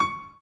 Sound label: Piano Chord